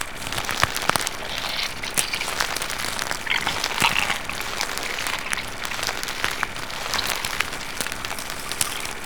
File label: Shed Loop; Warframe; Hydra